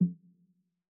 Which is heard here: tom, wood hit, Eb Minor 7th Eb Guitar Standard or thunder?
tom